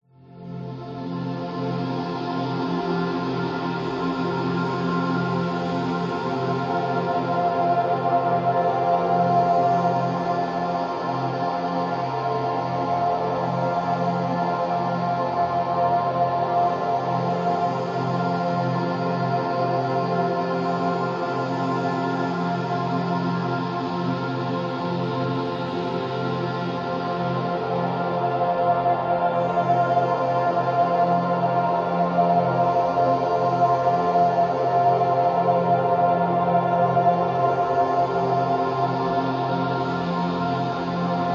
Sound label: science fiction atmosphere glorious opening landscape wonderous; zapsplat